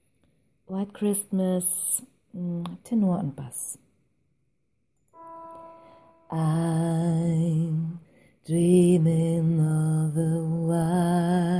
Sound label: tenor bass, gospelchor